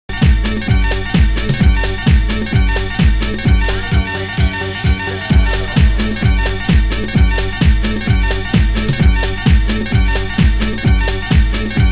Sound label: funk